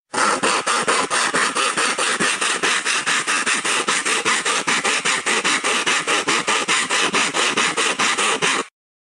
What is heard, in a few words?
sawing sound effect